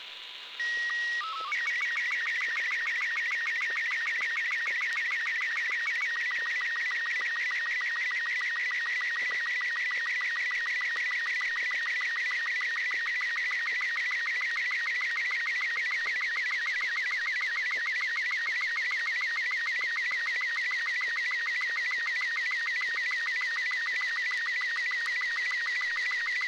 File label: arissat sstv green